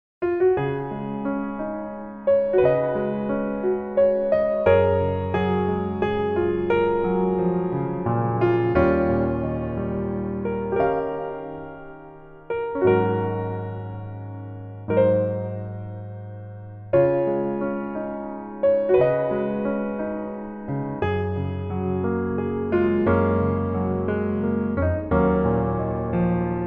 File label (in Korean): Piano ver, 남자키